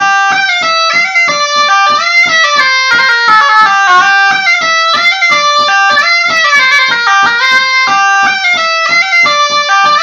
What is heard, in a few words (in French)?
La Bourrée du Coucou; Bourrees